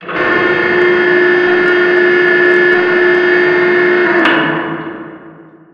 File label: bay doors; breakout; dod